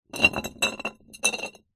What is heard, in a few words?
bottle 660ml glass beer empty short roll on concrete; foley; zapsplat